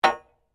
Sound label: SLEDGEHAMMER METAL IMPACT HEAVY